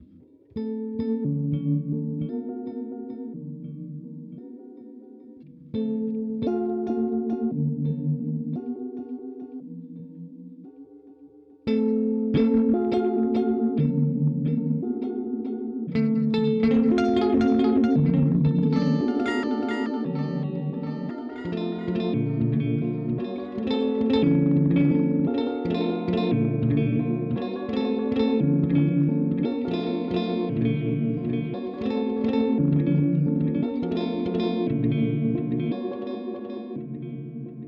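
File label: Modulation Frequency Square; Red Panda Raster V2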